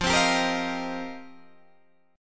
F 7th Suspended 2nd Suspended 4th Guitar DADGAD, chord; F7sus2sus4; strummed